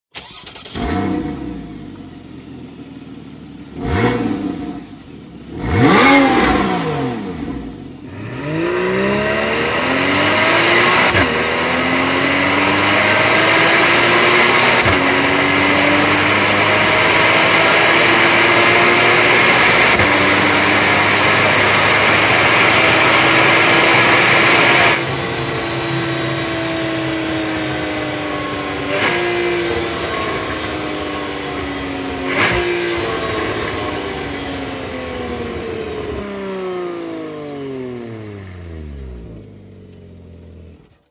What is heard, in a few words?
Stock Exhaust